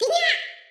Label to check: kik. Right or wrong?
Wrong